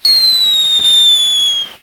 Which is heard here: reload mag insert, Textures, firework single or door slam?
firework single